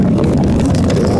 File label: rumble